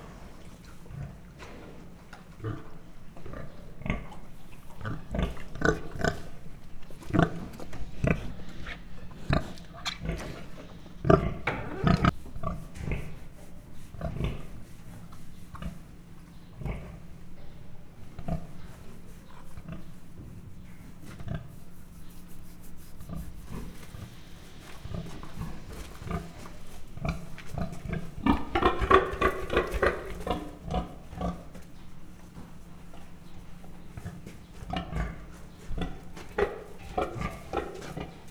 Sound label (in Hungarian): röfögés horkantás, mangalica; miskolci zoo 2009